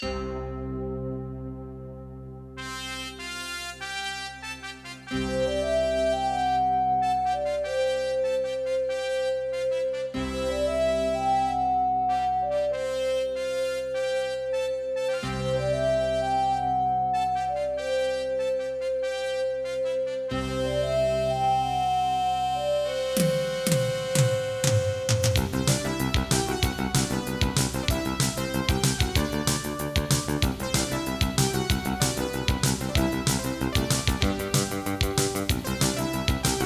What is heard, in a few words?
AWE64 Legacy; Space Quest 3 Introduction